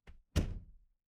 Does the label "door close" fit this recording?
Yes